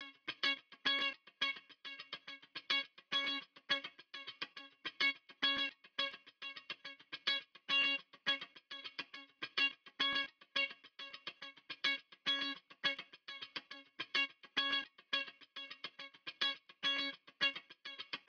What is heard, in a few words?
Guitar